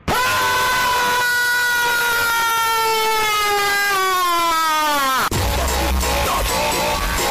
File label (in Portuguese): susto funk estourado